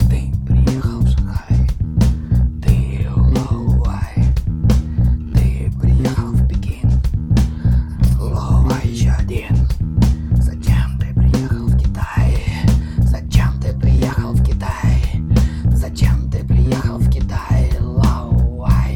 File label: blues